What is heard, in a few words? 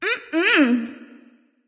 nv disagree; smite; awilix